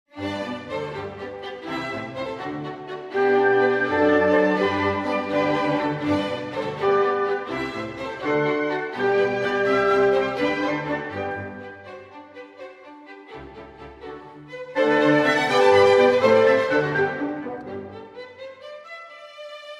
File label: Violin Concerto in G Major, Rondo - Allegro; Violin Concerto No. 3